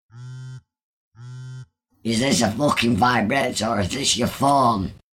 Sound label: Vibrate